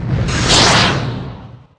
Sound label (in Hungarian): raketa; Pure Pinball